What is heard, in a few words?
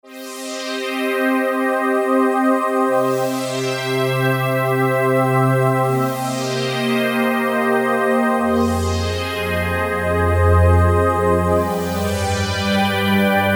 Falling Pad